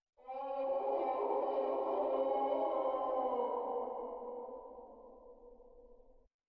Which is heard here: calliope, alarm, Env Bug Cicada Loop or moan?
moan